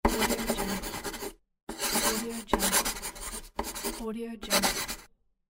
pencil drawing

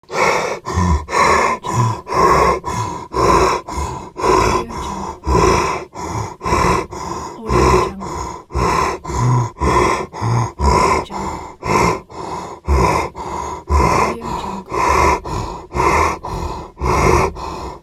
monster fast breath

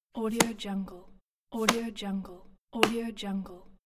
closing book; dragospitaru

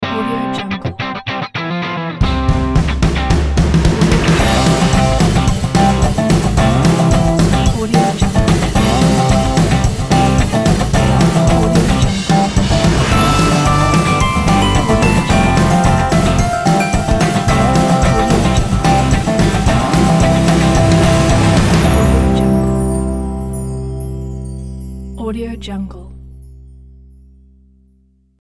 modern indie rock logo

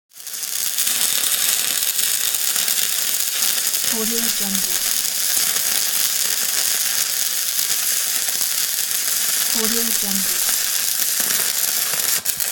a welding; pure-noise

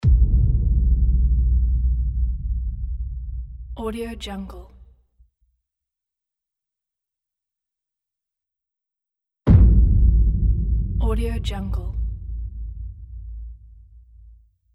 cinematic impact

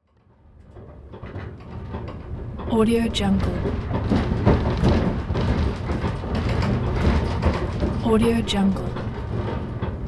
in the train

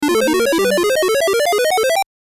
retro 8bit video game ascending sound

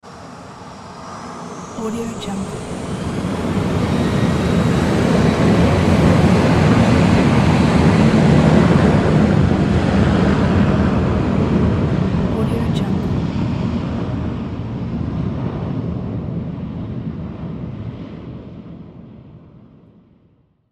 airplane take off

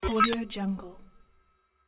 futuristic digital interface beep and click

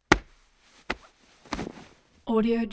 body grabs

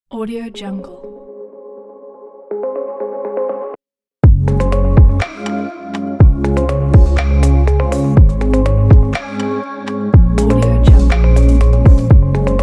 modern beauty abstract

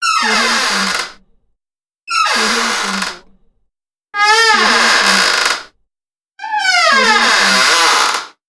creaking doors

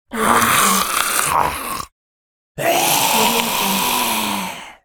zombie attack roar

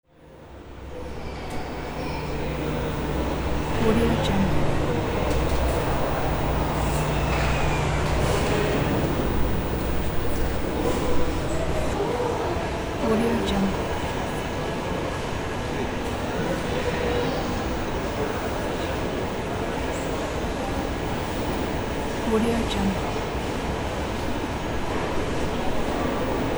airport ambience; dropstudiomarket

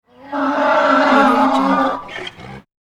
donkey grunt; hollywoodedge

